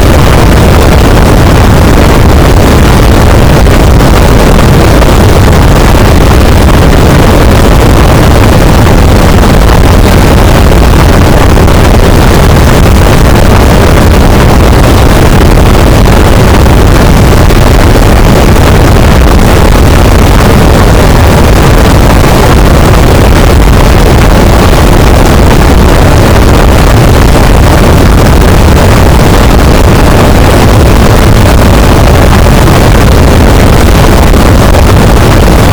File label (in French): cachalot negatif, boat, Physeter Macrocephalus